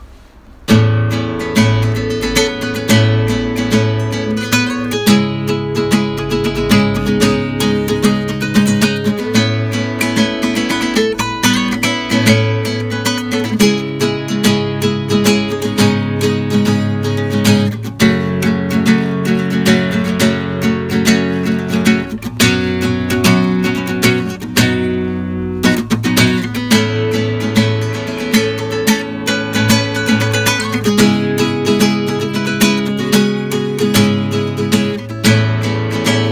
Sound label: AC200, klassicheskie gitary; Аудиозапись AC200-2